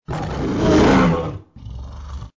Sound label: lion roar; rychaniya lva